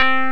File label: Electric Pianos